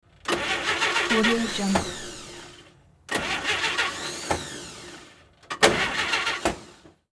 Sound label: car false starts; hollywoodedge